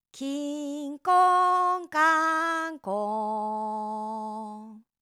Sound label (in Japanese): きーんこーんかーんこーん, 効果音